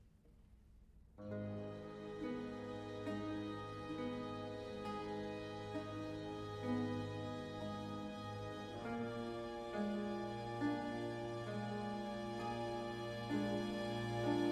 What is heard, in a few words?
Concerto for Strings in C Major, Largo; Vivaldi; Concerti per archi; Concerto Italiano